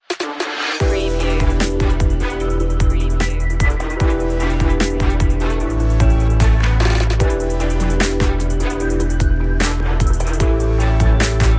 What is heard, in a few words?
cool trap intro